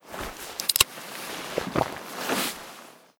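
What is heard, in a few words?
inventory drink water; Stalker Anomaly; interface item usage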